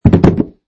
Box Drop

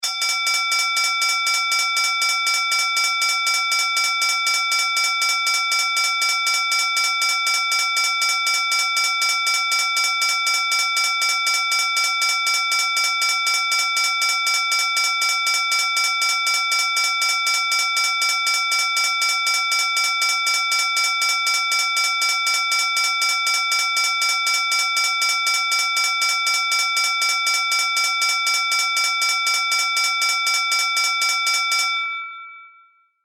Vehicle Train Crossing Bell Two Bells

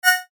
Rollover Synth Whoosh; Mouseover; Click, Descend, Trill; Multimedia; Blastwave

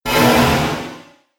Synthetic Crash Sound, impact; Miscellaneous Impact Sound Effects

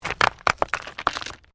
STONES MOVE; Material components for constructing more complex sounds

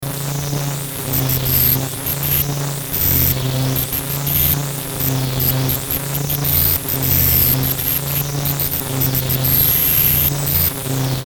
static frequency; Static Frequency Sweep Bursts; Production Element Imaging Element Accent Transition; Blastwave; Static Sound Effects

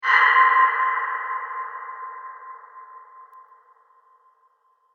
Shock And Horror; Evil Sound Effects; Andrew Fleming

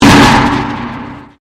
Convolution Pulse

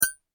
impact metal small; Materials; McKinney Sound

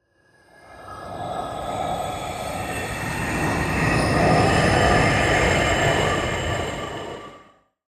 Whoosh Ghostly, Breath, Horror, Transition; Production Element, Imaging Element, Accent; BlastWave

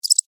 Cricket Chirp, Single, Insect; Animal; Bug; Crickets

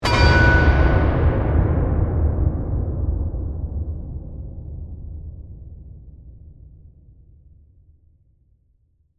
Hit To Explosion; Production Element, Imaging Element, Accent, Transition

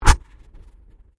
Convolution Surge

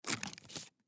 Box Candy Open; Box Candy Open Mints Package; Rustling; Foley; Sound Effects:Objects; SoundEffects-Blastwave

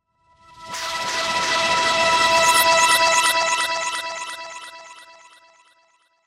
SWELL SWEEPER, Swell Sweeper Delay Tonal; Blastwave; Production Element Imaging Element Accent Transition

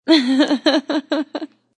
A woman chuckles.; Laughter; Voice

